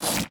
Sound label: Skill Slash; Magic Shrek